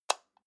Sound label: light switch off